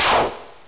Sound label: Spell final; Touhou Jitsuzaisou Dream Logical World